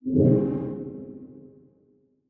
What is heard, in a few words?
Title Transition Blurp; Adobe; Production Element